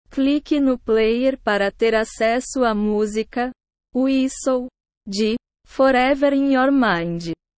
whistle

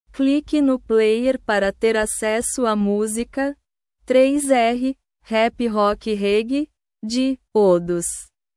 rap rock reggae

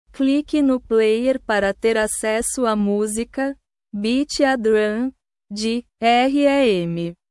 beat a drum

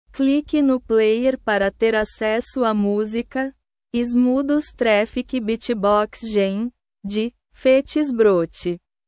traffic beatbox jam

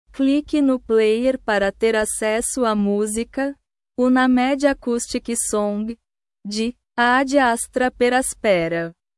unnamed acoustic song

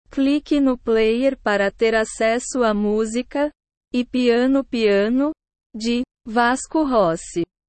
e piano piano

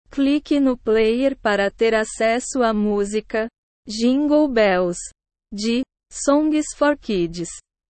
jingle bells

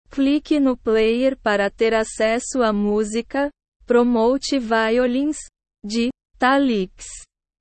promote violins

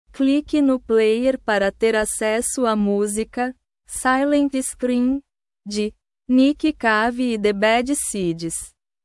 silent scream

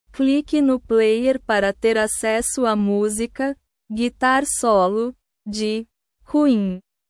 guitar solo

